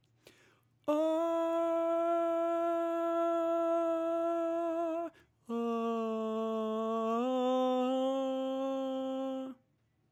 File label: tenor